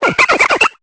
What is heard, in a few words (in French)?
Cri; Upload cris EB